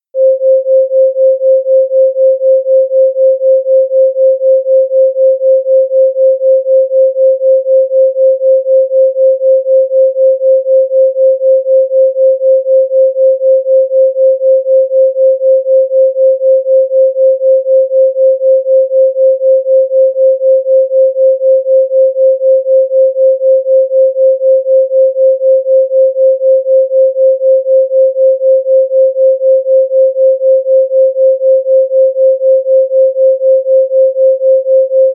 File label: binaural 528 beat 4